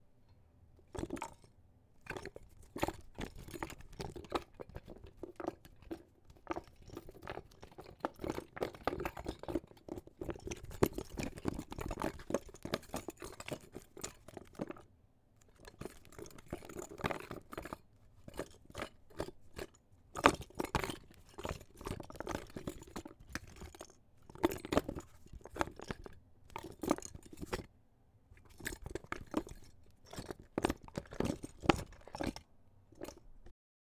Rummaging Through Items

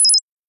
Confirm Click Spacey

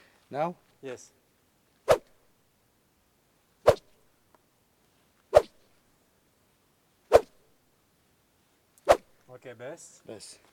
Arrow Cutting Through The Air

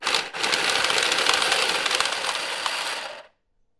Card shuffler

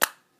Flip Cel Phone Snaps Shuts

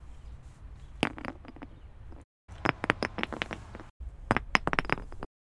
Rocks Compilation

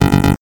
Error

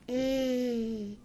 Mmm Girl

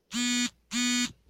Phone Buzz

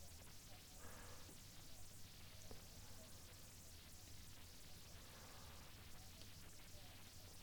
White Noise

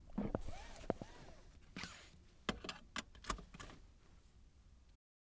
Seatbelt

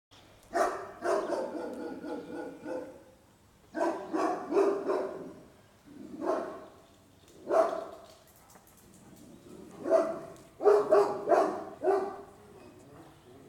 Barking Dog

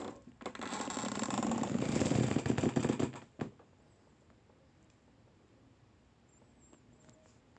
Leather Couch

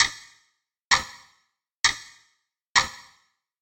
Clock Ticking Loop

